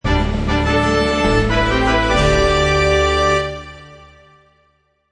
Royal Fanfare, for the kings (or queens entrance, Orchestral Sound Effects; Musical